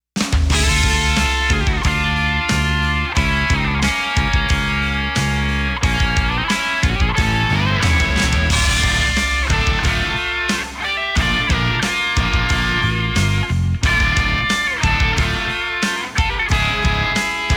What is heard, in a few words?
Match Loss; Against - Luigi